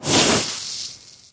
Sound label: Burnt Burger; Food Truck Game